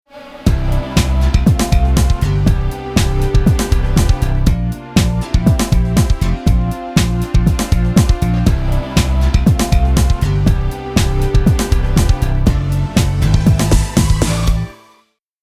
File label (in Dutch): televizier tune; AVRO